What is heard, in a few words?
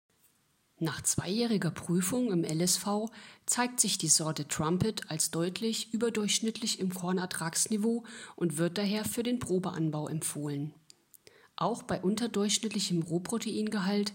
Trumpet